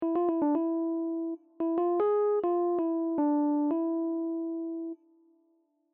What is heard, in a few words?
phryg dom melody